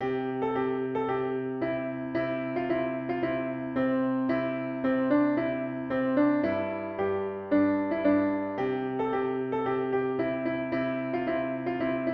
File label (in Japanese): ピアノ